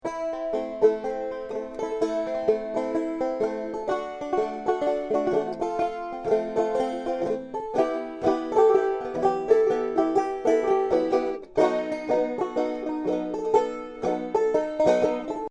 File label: Sadtown Banjo; Outdoors Old